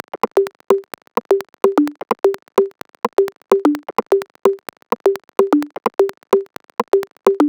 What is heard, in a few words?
128BPM Drums, Tech House; Averna; Vengeance Samples; Bonus TechHouse Kits